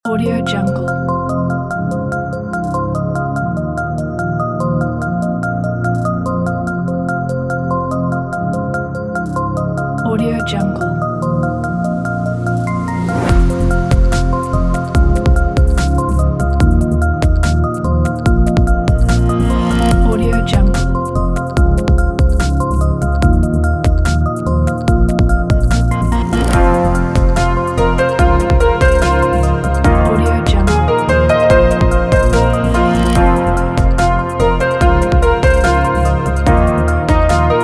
city timelapse

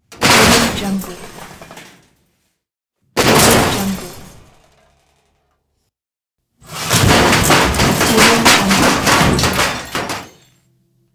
metal crash

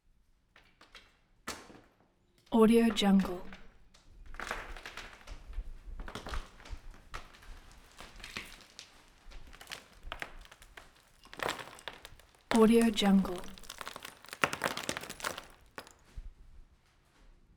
plaster wall aproaching debris fall; collanderson

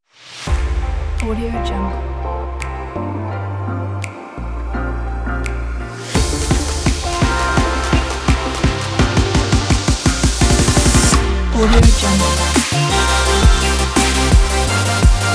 optimistic future bass music

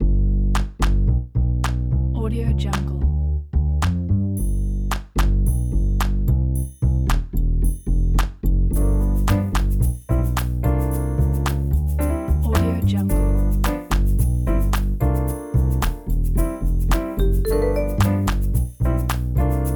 happy jazz

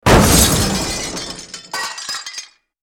metal impact big glass shatter and debris; hollywoodedge

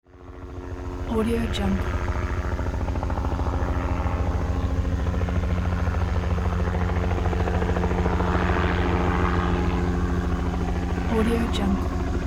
vintage helicopter circling around overhead

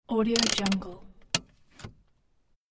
car handbrake

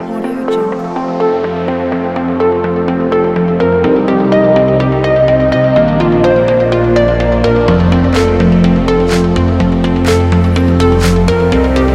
summer modern corporate dance

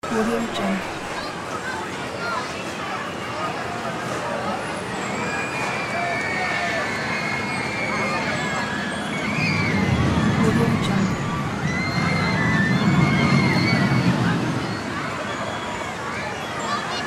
amusement park

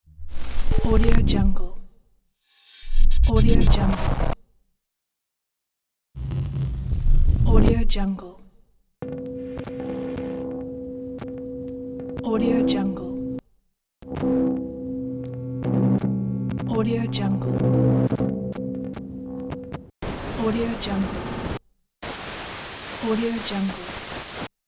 glitches pack

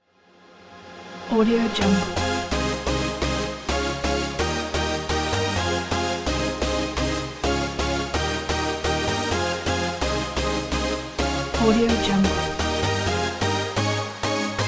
summer upbeat dance progress house

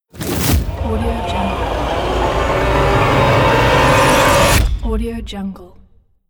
logo intro

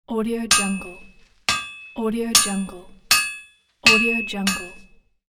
hammer in the forge

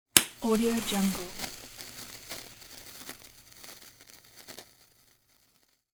match strike

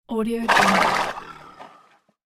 horse snorts

